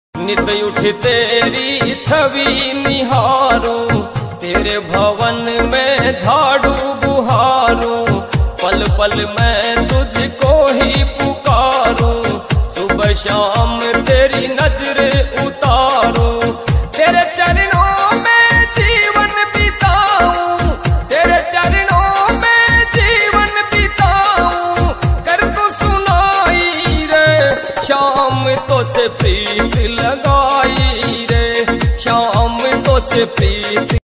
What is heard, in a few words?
khatu shyam ringtone bhakti bhajan; khatu shyam baba